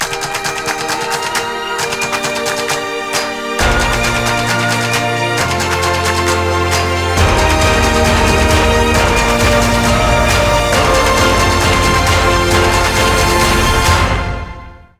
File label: Ringtone